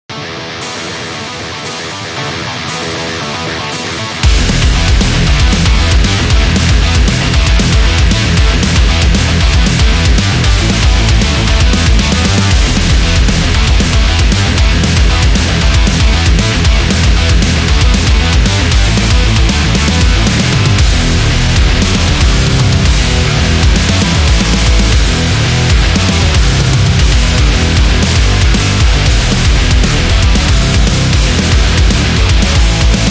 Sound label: Speed Heavy Metal